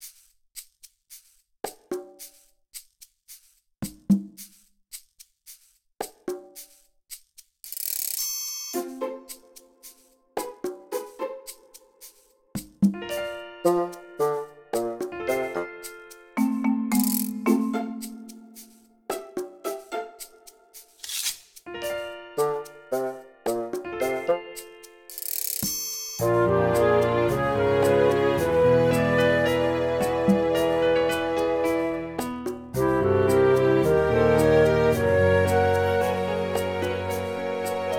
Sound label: BGM Comical; aster tatariqus android ios mobile gamerip 2023